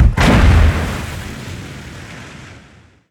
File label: damage hits explosions; World Of Warships